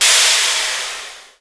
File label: air zero; OpenBVE